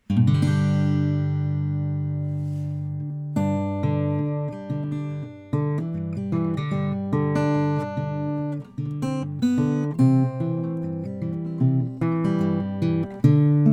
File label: Guild M20 Natural